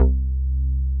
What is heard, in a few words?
JX JACK C3, JACK BASS; Drums In Your FACE!